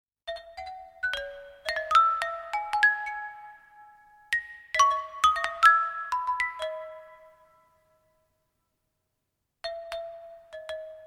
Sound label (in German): lithophon